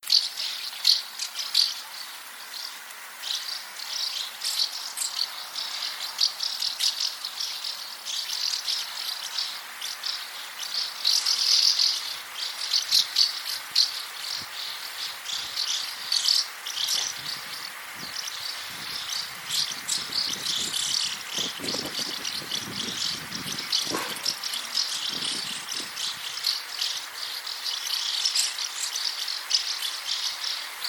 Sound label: Delichon urbica calls breeding colony